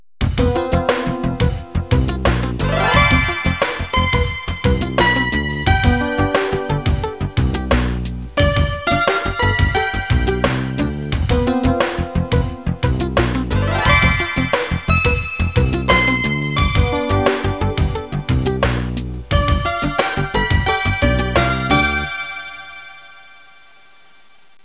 suonerie businessy

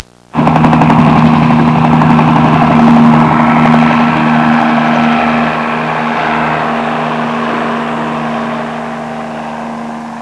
HELICPTP, Elicotteri; trasporti

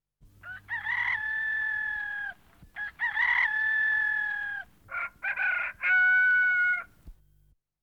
uccelli birds, animali

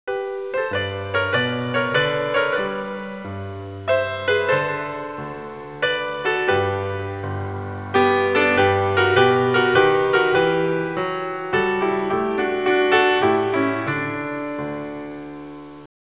suonerie classica; musica classical

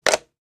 suonerie telefoni fax; phone

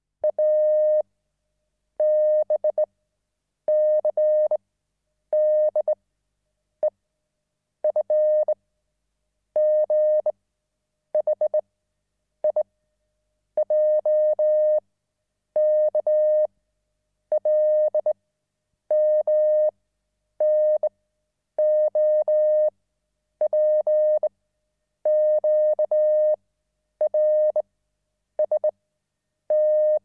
MORSE TELEGRAFO; phone

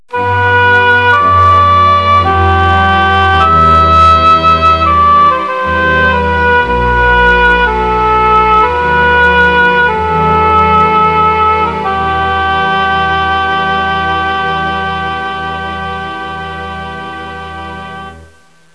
musica newage

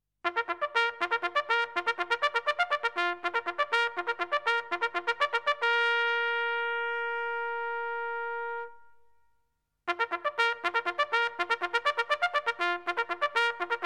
suonerie tromba militare

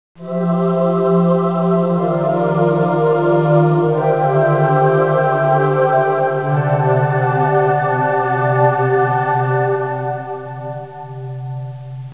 audio suonerie musica mistica

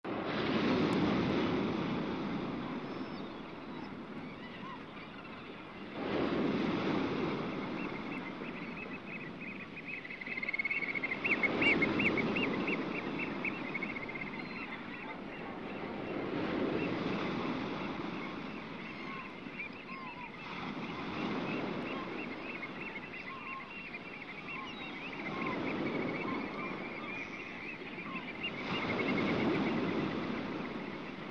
uccelli birds, animali

